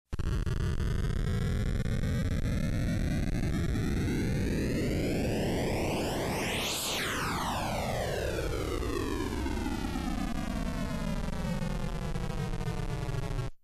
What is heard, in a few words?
variable frequency white noise